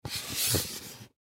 Gift Box Rub With Hand; Rustling Sound Effects; Objects; Foley

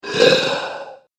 Alien Ogre; Alien Sound Effects

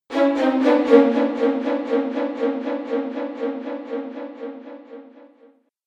Fail Strings; Musical; Musical Sound Effects

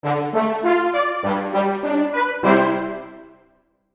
Fanfare Arpeggio Resolution; Musical

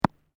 Sport Ball Rubber Bounce Gravel, Rubber ball; Bounce Sound Effects; Objects

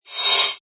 Metal Smooth slide; Metallic; Lynne Music

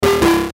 Error Tone, Error Tone Multimedia; Web Interactive; Technology; Noise; Electronics

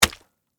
Impact Splat Orange, Texture, Fruit; Splats (Sound Effects:Objects); BlastWave

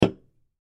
Can Beer Set Down Full; Set Downs; Foley; Sound Effects:Objects; SoundEffects-Blastwave

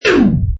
Stopper, Button sound, stopper.; Button Sound Effects; Multimedia; Lynne Music

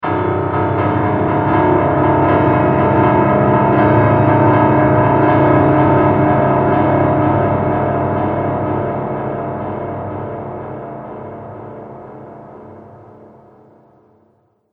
Piano Horror Short Drone

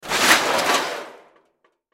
Crash Debris Junk Metal; Crash Debris Junk Metal Movement; Sound Effects:Materials; Blastwave

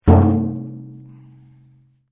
RUBBER ON METAL HOLLOW; Materials

